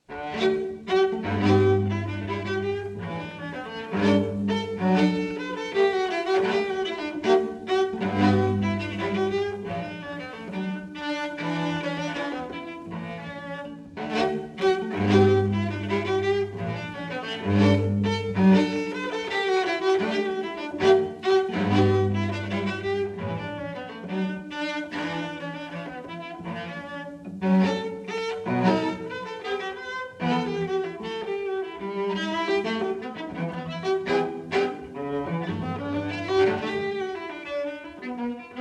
Suites for Solo Cello NO.6 in D Major V. Menuet I Live; Bach Suites for Solo Cello, Bvw 1007-1012 (Live)